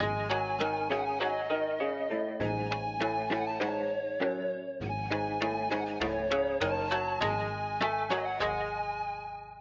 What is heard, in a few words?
guitary; Loops; Electronic